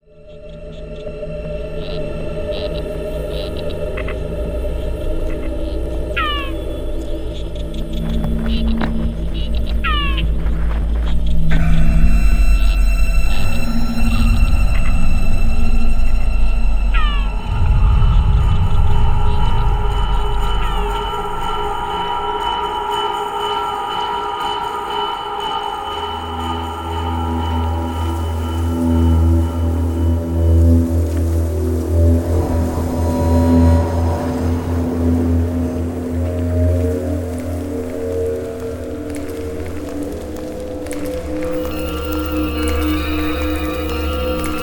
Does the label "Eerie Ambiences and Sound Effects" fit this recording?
Yes